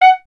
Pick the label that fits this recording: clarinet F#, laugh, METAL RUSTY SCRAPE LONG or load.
clarinet F#